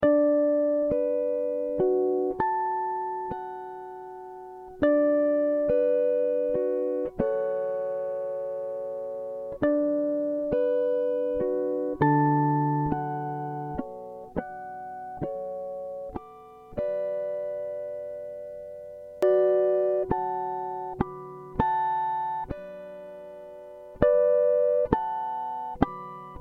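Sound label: Bm 100 guitar harmonics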